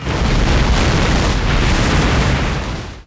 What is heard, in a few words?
Forest Landing, Aircrafts; DCS